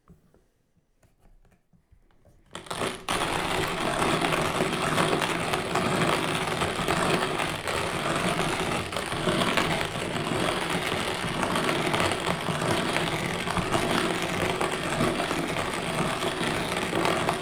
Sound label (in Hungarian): darálás, kávédaráló kézi; Perkupa